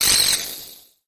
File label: Thin Grit Burst Sweep